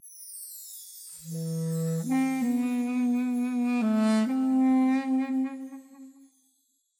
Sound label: Duduk; Temple Rnd; tintin reporter cigars of the pharaoh